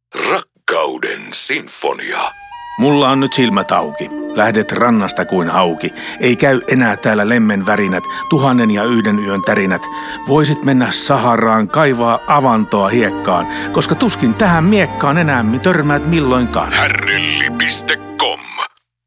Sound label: Sinfonia; Hardelli; Sinfonia 2